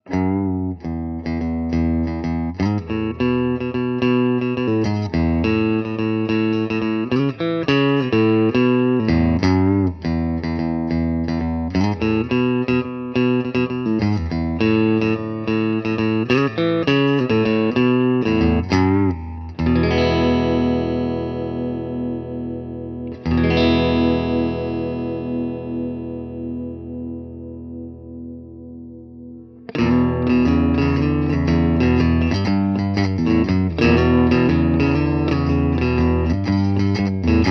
Neck, pickups

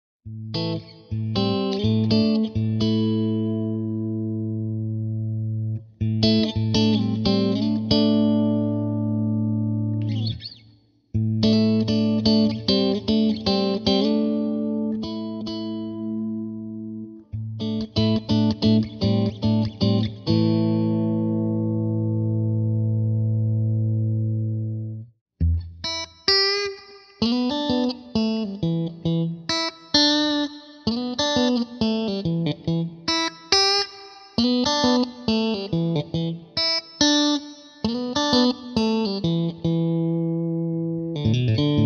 bridge middle, pickups

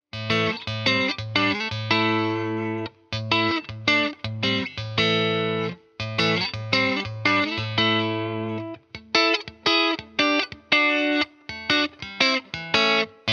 Bridge Clean, Tele

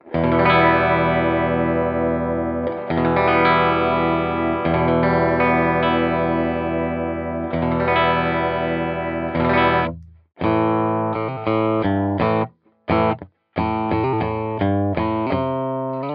bridge clean

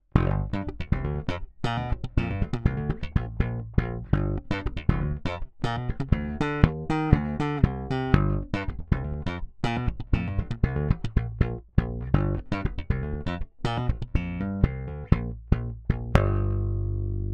Slap, J Bass

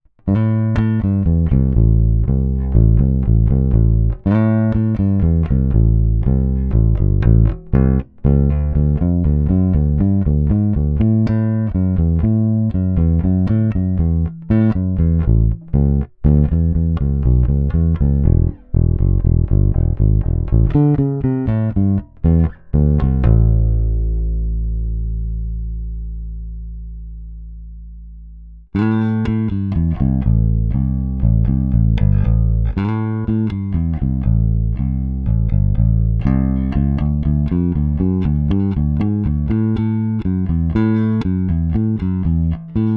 Rock Finger, JBass Linear

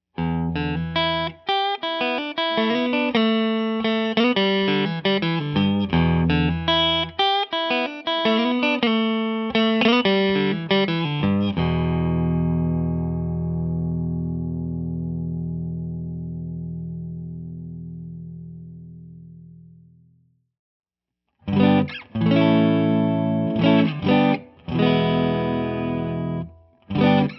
neck clean, pickups

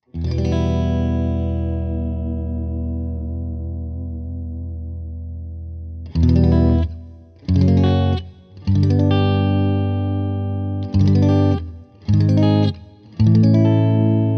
middle clean, pickups

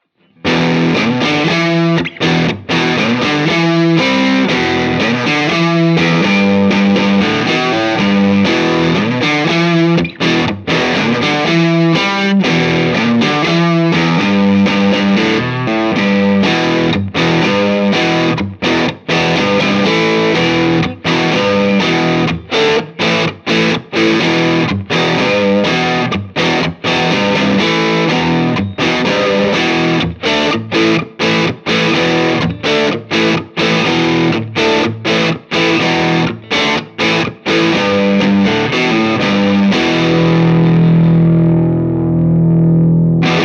Firebird Neck Crunch OCD Fender Deluxe, pickups; Revel

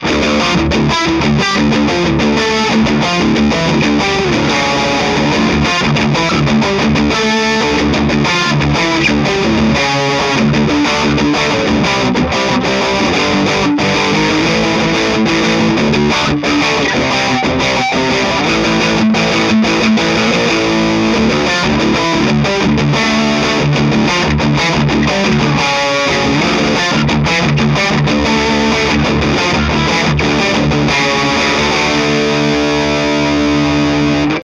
Bridge Heavy, pickups

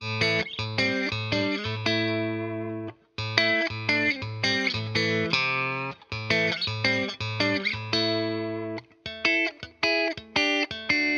Middle Clean

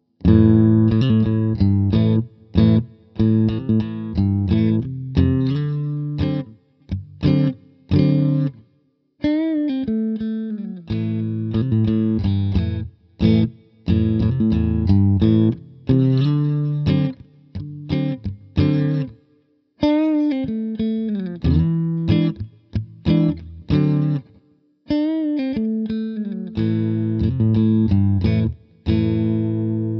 Middle Full Clean, pickups

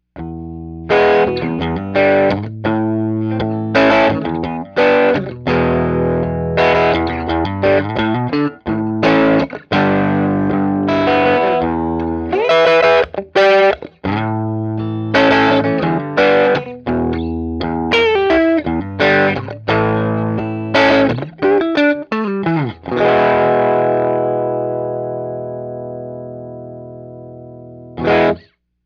Middle Dirty, pickups

